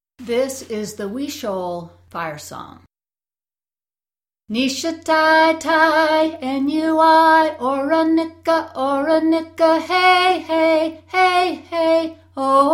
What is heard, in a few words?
Huichol Fire Song